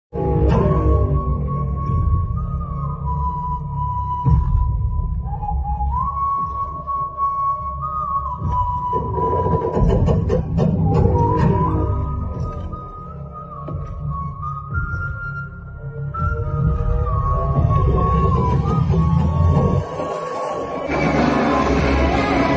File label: bgm ringtone whistle bgm; john abraham